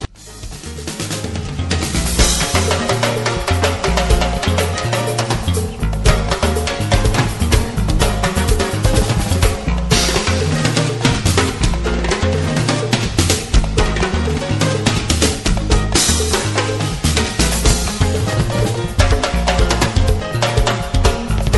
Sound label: percussion solo